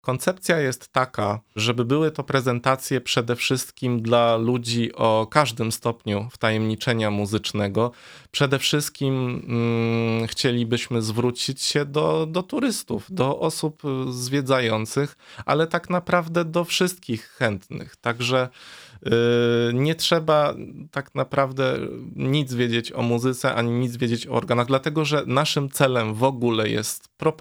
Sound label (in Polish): organy; Letnie Prezentacje Organowe w kościele sw marcina; Wrocławiu